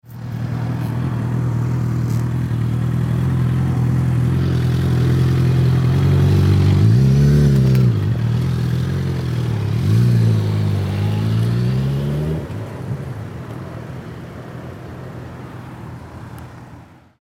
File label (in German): motorrad